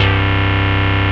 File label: rave beep C1; Best Service Alpha Dance vol.2